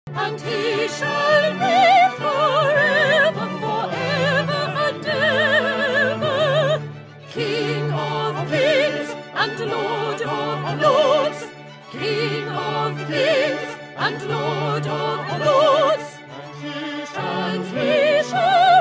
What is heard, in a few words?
Audition Practice Hallelujah Soprano; ESYO Melodies